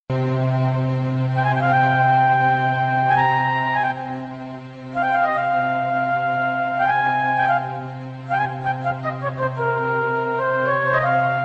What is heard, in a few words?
flute